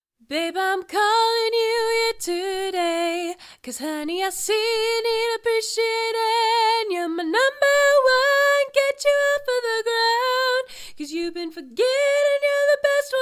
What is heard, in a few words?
Pop